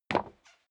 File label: step sneaker wood creak single stair down; schritte